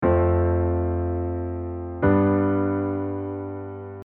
chord cadence

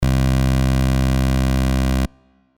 pick osc

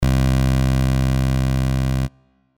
pick vca

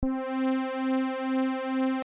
Brs lfo; 4-lfo

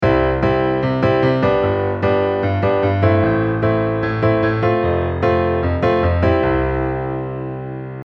8 beat

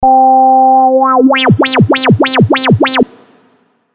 wah

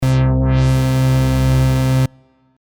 house bass, fx

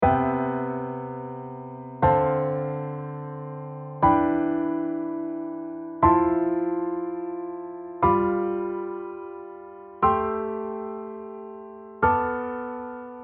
diatonic LH R7

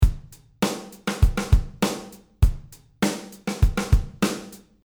shakebeat drum

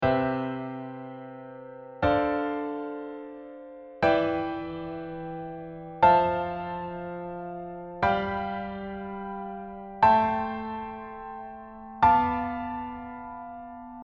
Diatonic 7th

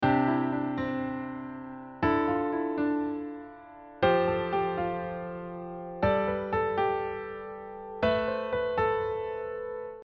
sus2 sus4

